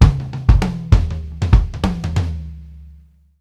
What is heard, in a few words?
SWING IT 2 L, Drumworks FILLS 96; Sampleheads; New York City Drumworks VOL-1